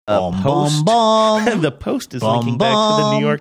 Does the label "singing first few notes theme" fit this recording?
Yes